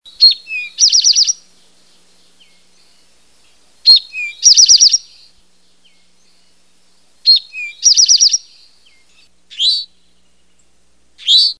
Eastern towhee

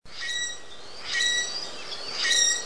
grackle

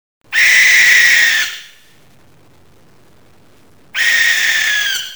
barn, bird sounds

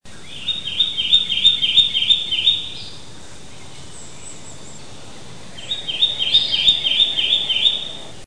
Kentucky, bird sounds; Aves

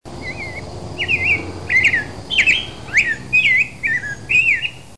rose breasted grosbeak

